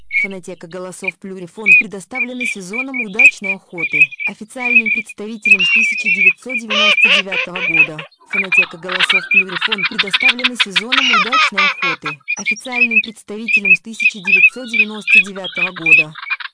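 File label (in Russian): свистунок, манок; plurifon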